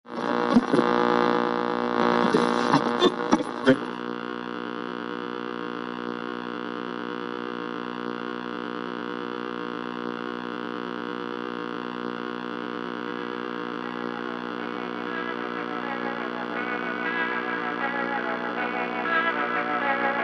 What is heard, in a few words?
scanning